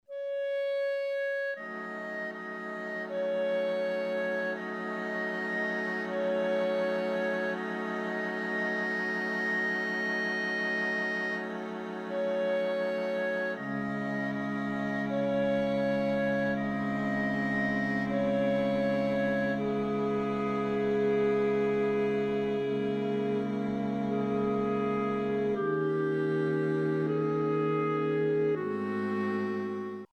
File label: Orch